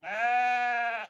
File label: sheep; fauna